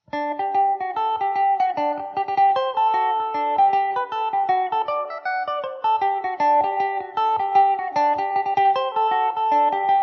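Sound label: G major mandobird, irish mandolin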